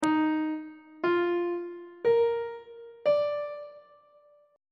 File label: e flat major 7th suspended 2nd chord intervals on treble clef